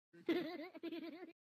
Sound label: Goofy Mix; Goal Sound; Blue Lock Rivals; roblox